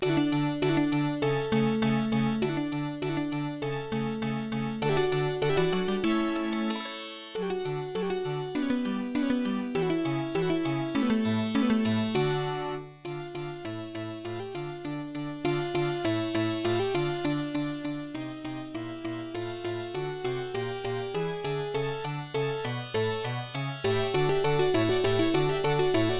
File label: Lute Concerto D-Major; Vivaldi; AdLib Gold Demo Songs; MS-DOS